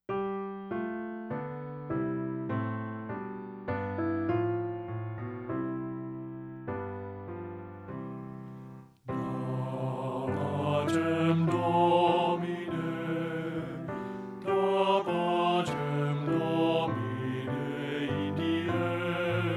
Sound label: BASS; TTB; men